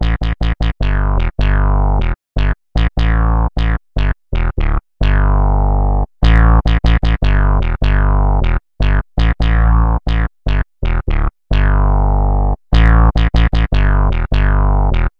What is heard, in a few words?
ARP 2600 Bass; Bassesland; Syntheway